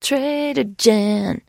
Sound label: trader singing; 商人杰恩（七日杀）